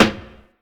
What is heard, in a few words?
tight low end snare one shot a key; snare-drum-samples